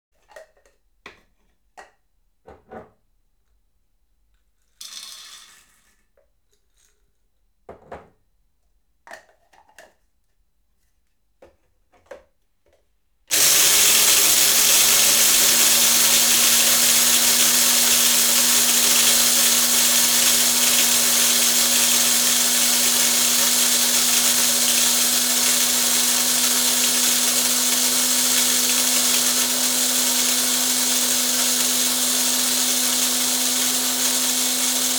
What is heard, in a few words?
Coffee Grinder Filled, Operated; Household; BBC Sound Effects Library